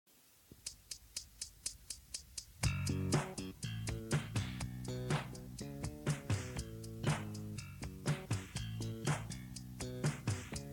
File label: Slap Bass Vocals Faint